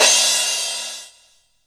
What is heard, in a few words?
crash, Korg Electribe ER-1; Kit 4